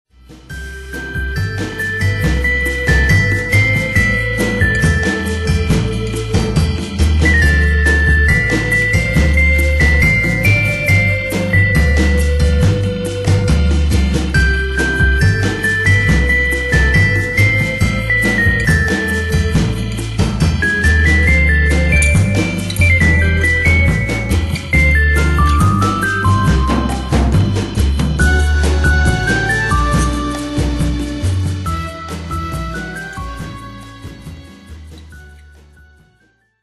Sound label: bossa